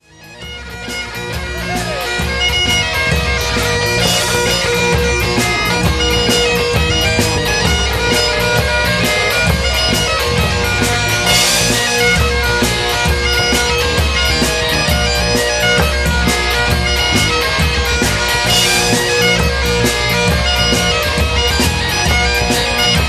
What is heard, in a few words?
big pipe jigs